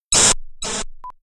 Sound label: Distort Hit